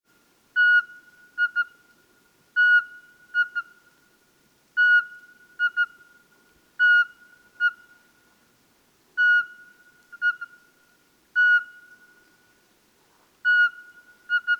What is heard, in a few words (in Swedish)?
Sparvuggla